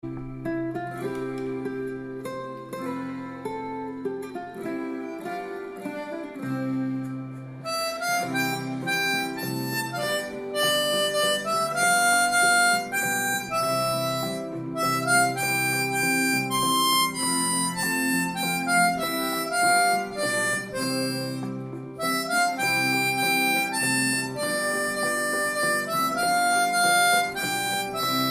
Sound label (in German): Schneefloeckchen, Weissroeckchen C, Mundharmonika